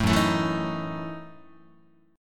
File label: Gb Minor 13th Gb down Guitar; strummed; JGuitar